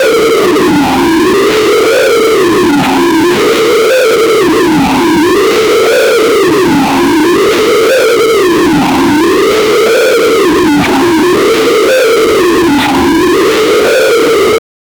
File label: sounds of ww2 armie, army